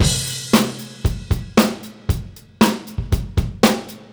116, Beat Loops; Zero-G; Monster Beats

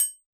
909 kit triangle

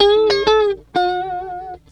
GUITAR, Funky GtrLicks; E-MU Formula 4000 Series Vol. 1; Hip Hop Nation

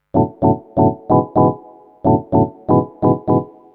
Organ chops (125BPM), retro house

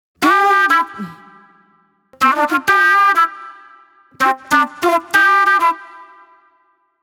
Acid Jazz Flute; Sonic Foundry (Sony Creative Software); Manhattan Flutes; Smooth Groove

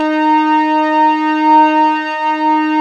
D#3 SYNTH, SYNTH ORGAN; AKAI S-Series CD-ROM Sound Library VOL-7

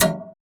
PLASM, SPACEJUNK; Spectrasonic Distorted Reality 2

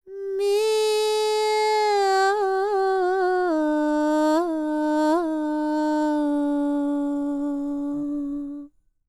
World Voices, INDIA; ILIO; Vocal Planet

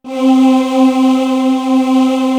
SUPER VX, 01W CHURCH; East West; Global Sounds

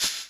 GATED SHAKE, MPC-60 DRUMS; Greytsounds VOL-1

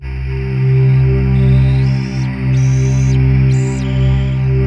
WS DA C 3-L, Korg Wavestation; Back In Time Records; Korg Universe VOL-1

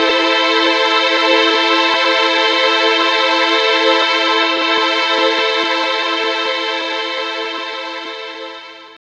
DR. LOOP; X-Static Goldmine 3; CHORDS

